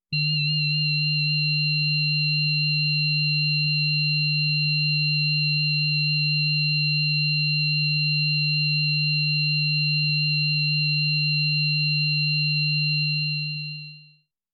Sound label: Suspense long beep